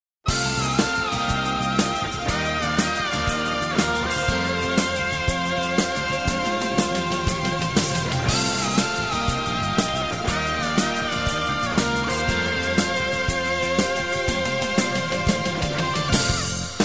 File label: brass minus